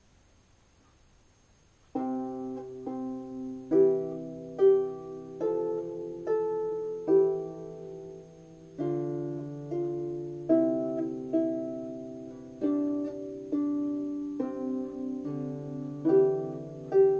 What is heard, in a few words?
twinkle twinkle on the harp